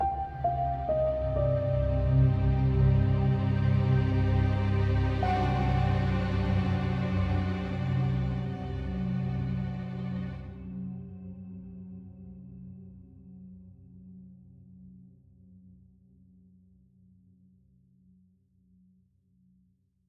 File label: String; assassin s creed unity gamerip 2014